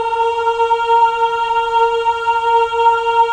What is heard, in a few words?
men ah, Choir of Ahs; Roland - LA Composer vol. 1